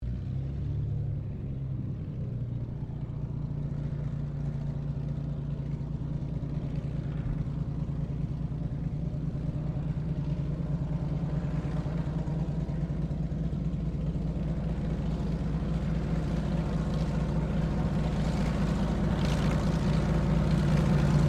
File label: AIRCRAFT CT4A TAXI SHUTDOWN, military trainer aircraft; Airplanes